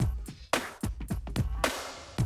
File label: Classic DrumLoops, 110